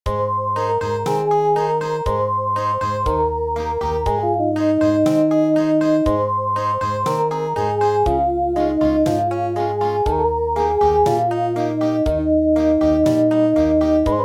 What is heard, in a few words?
Country, midi